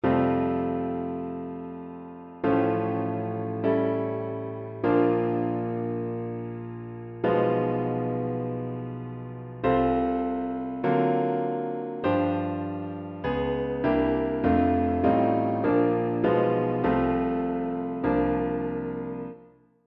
Gospel Blues, piano

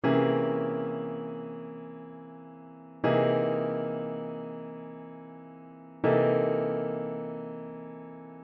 Upper Structure Tension, jazz piano

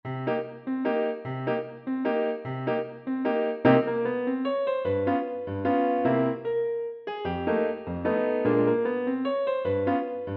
Fire Waltz, piano

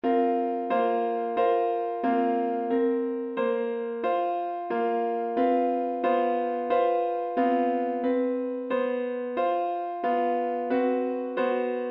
Fourths, piano

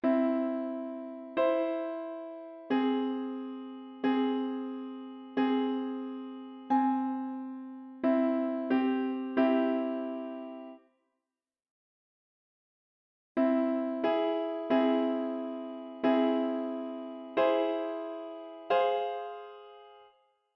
Major 3, jazz piano

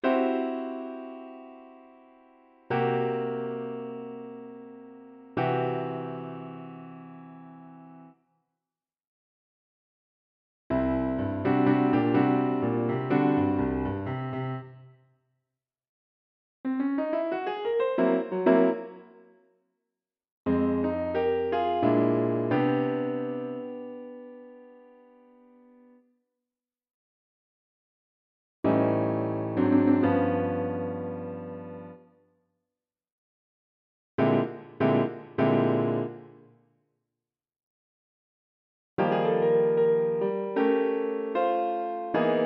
Sharp 11, jazz piano

